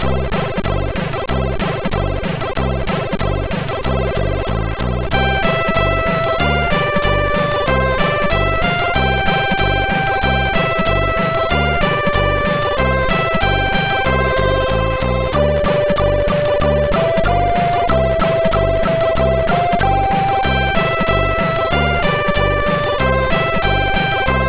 Children Songs, MOS6581R2

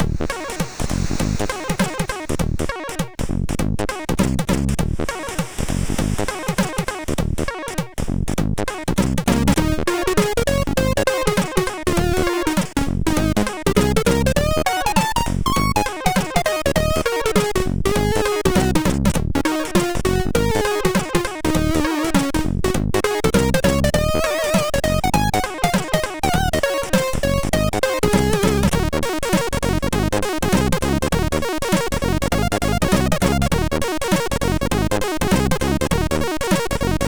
Jazzy, sid MOS6581R4

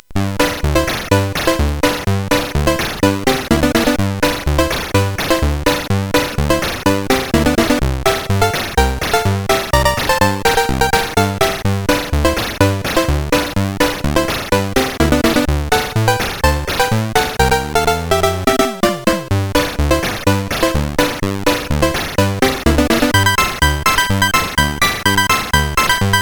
Synth Sample, CSG8580R5; hvsc

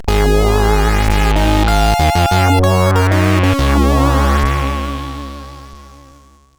Game Music, CSG8580R5

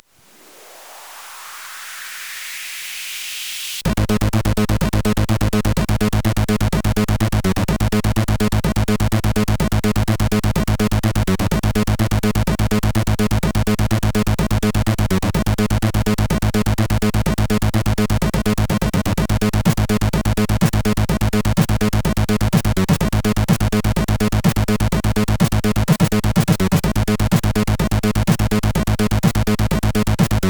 Trance, CSG8580R5

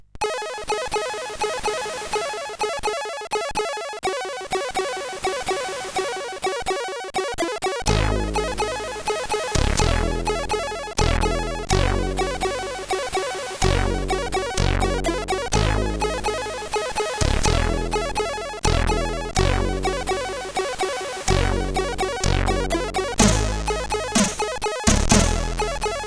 Fresh Breath sid CSG8580R5

